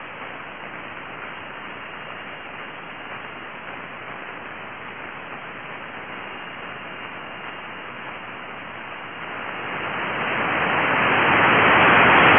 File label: solar radio bursts